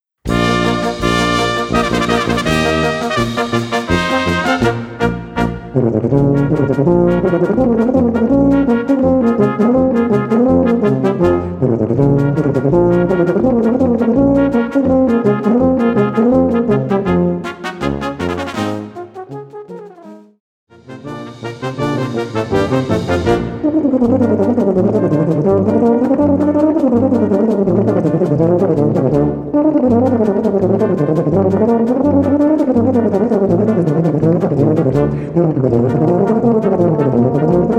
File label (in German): Türkischer Marsch